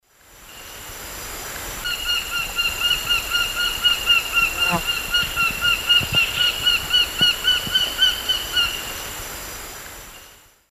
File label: Falco deiroleucus, flight calls; S. America; Eastern Lowlands